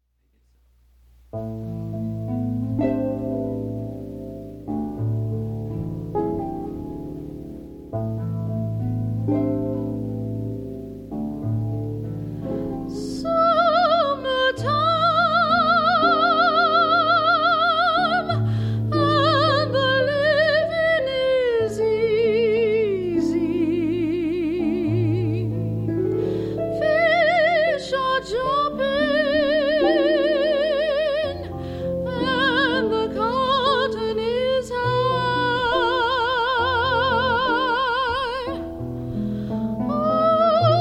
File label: Soprano Harp